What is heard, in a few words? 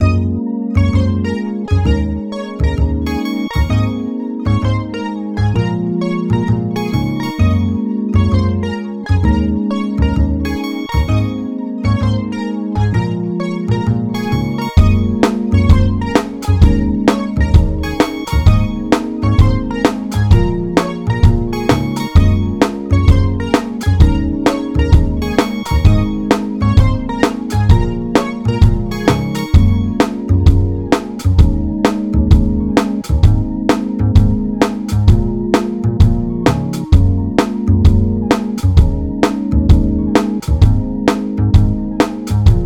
Emotional Rap Beat 130BPM; hip hop; genx beats